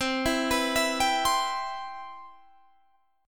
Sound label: C Major 7th C down